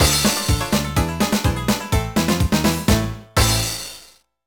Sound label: Sting sad; Wacky Races Crash Dash